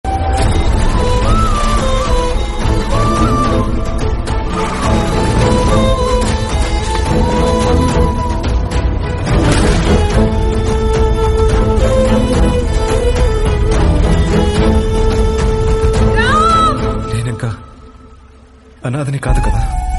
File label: bgm telugu; sita ramam; dulquer salmaan